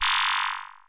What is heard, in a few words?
select droid